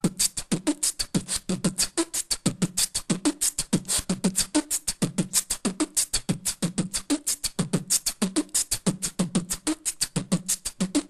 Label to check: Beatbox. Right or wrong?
Right